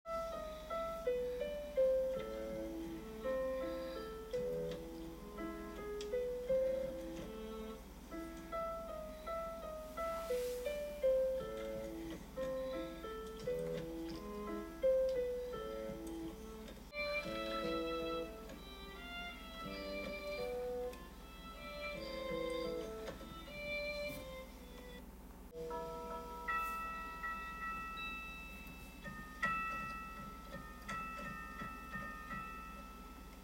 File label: Orchestral Fur Elise